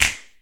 hand clap a sharp key, Royality free clap tuned to the A# note. Loudest frequency: 3599Hz; percussion; single hits one shots